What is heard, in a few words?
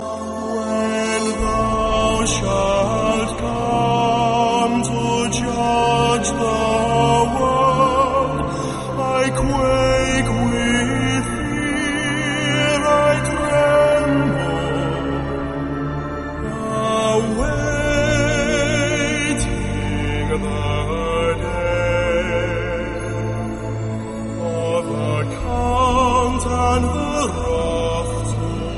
Gregorian Anthem